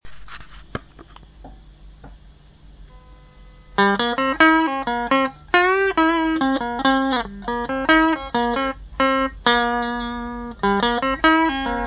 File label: fuzz